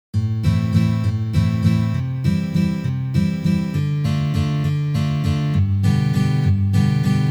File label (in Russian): Valsovyj boj na gitare